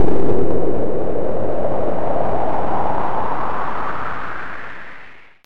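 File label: rain; ame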